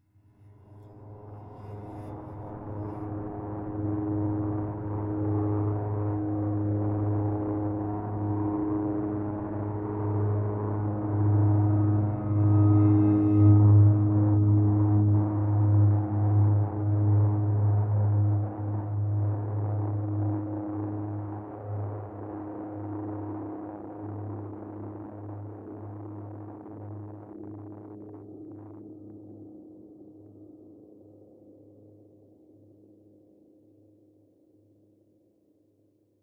suspense mood; inFamous; Play Station 3

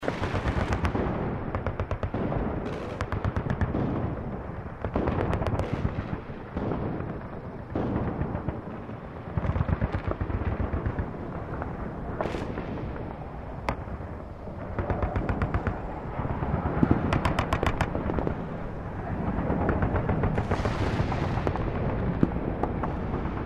city battle amb

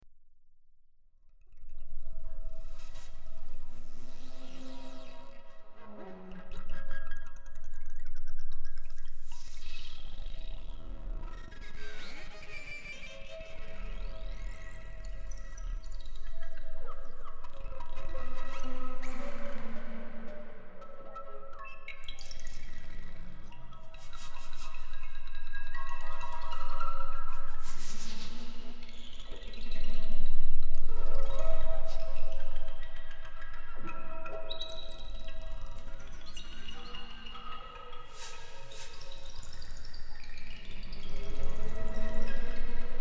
bridge suspense mood, music; inFamous; PS3

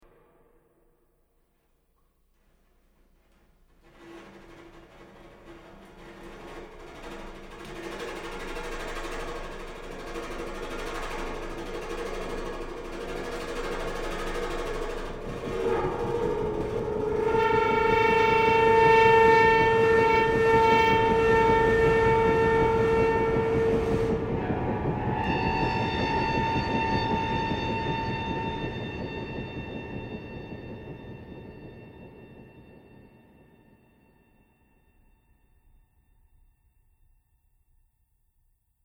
mood; inFamous; PS3

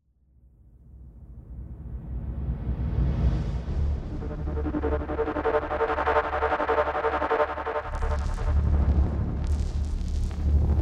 warren suspense mood, music; inFamous; PS3

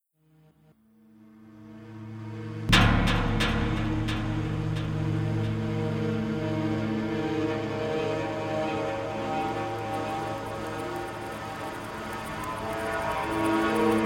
mood; inFamous; PS3

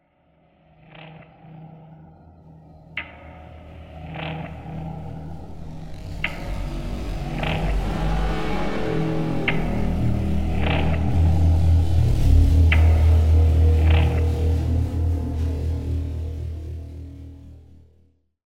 bridge suspense intro; inFamous; PS3

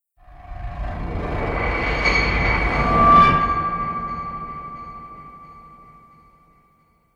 mood; inFamous; PS3

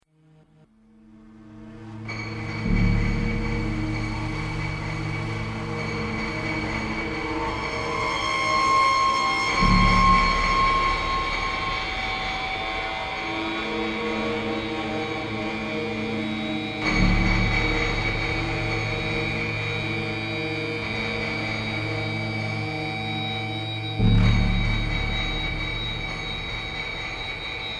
mood; inFamous; PS3

